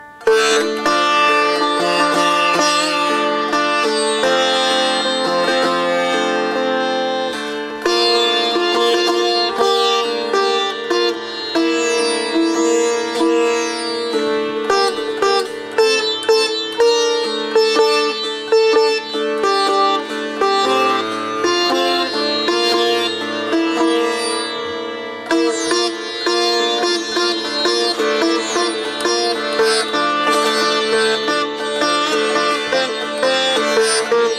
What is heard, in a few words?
Sitar